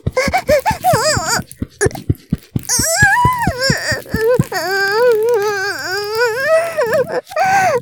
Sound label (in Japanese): 絶頂 潮吹き; 騎乗位